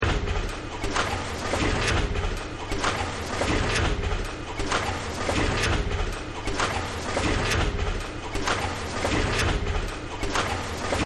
Factory; Factory 05

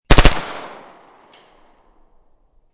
MP5 9mm 3 Burst, Machine Gun; Machine Guns; VRSonic

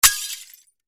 Crash Glass Debris Impact; Debris (Sound Effects:Materials); Blastwave

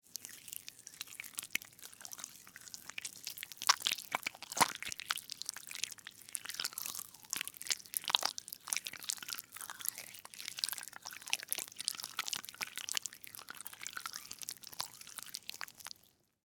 Slug Crawl Move, Slimy, Wet; Animal; Worms; BlastWave

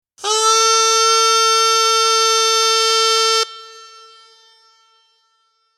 Chanter

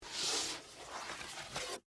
Game Board Up, Pick; Sports; Monopoly

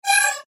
Beep Tone Signal Multimedia; Web Interactive; Blastwave; Technology

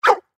Dog Labrador Bark; Animal; Dog Sound Effects

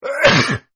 Man Sneeze, Man Sneezes; Sneezing; Human

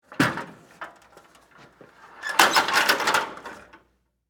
Metal Ladder Open, Unfold; Industry; Hand Tools

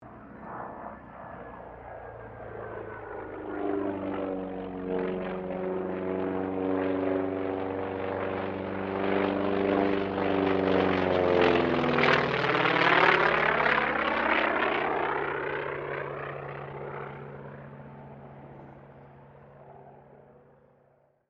AIRCRAFT T-28D TROJAN PASS; Airplanes; Sound Effects:Vehicles

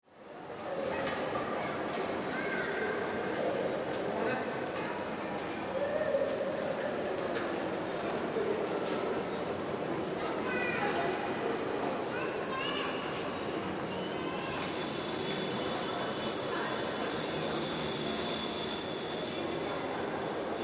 Ambience Airport Crowd Walla Europe; Airport Sound Effects; Airports (Sound Effects:Backgrounds); SFX Bible 6

